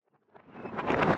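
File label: Retro Game Drawbridge Slam Reverse; Maze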